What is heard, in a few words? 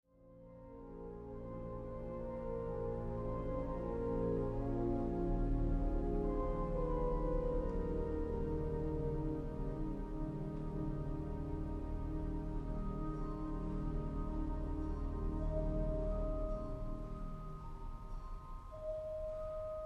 Carillon; Sowerby Symphony